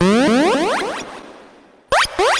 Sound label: teleport sound; pokemon